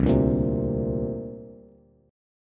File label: C 7th Suspended 2nd down Bass; C7sus2 strummed; JGuitar